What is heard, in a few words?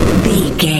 retro explosion general